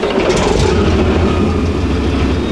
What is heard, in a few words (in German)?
Leopard